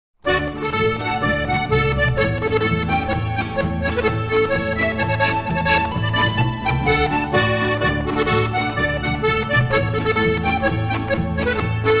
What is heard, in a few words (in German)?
Hackbrettpolka